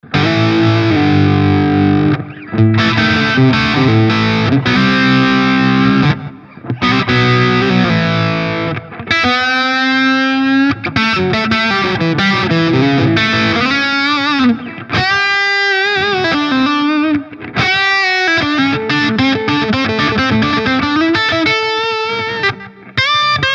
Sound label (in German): Steg HB verzerrt